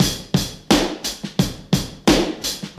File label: 86 bpm modern breakbeat sample A sharp key; drum loops